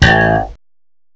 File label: BASS SLAP; M8; CMI Factory